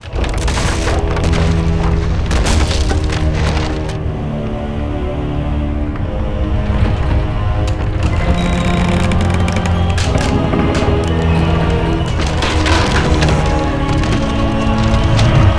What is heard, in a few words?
boss persian transform; cs boss persian transform